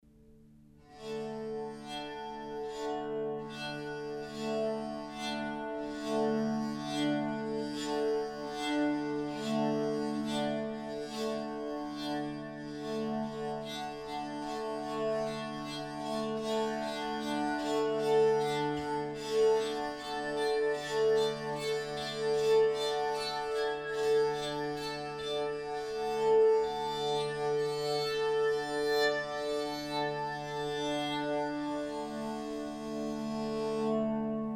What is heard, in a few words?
chestnut bari sound, bowed psaltery, Baritone